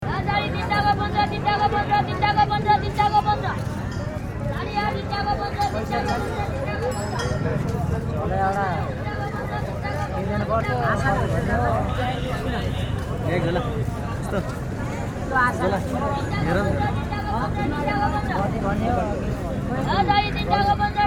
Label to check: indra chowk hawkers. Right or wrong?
Right